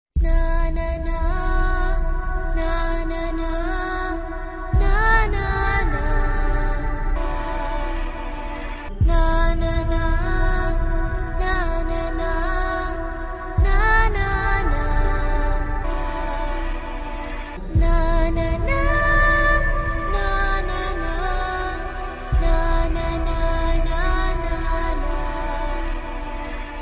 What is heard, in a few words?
Humming Tone, Telugu; AWE Teaser